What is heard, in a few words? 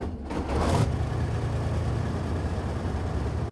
start engine, engines; World of Tanks